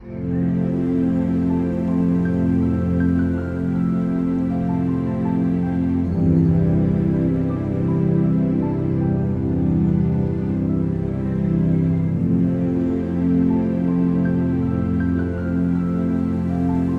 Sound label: meditation music